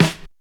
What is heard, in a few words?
perfect steel snare drum sound e key